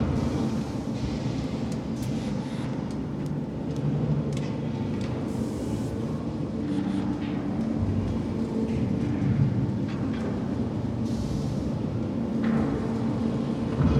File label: rocket silo working; factorio 2.0.55